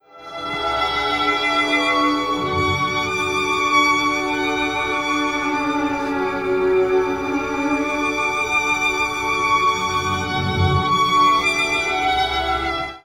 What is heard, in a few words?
STRINGS, Pure Electro; USB Soundscan vol.03; AKAI